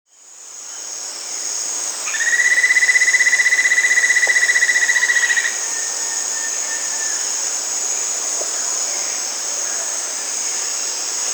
White-necked Puffbird (Notharchus macrorhynchos), Brazil, Peru; S. America